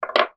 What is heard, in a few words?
technology headphone jack adaptor put down on table